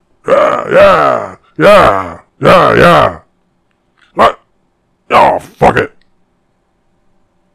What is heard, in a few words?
chainsaw solo vocals